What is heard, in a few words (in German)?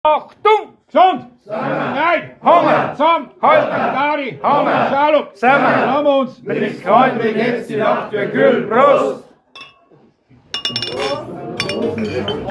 Schlachtruf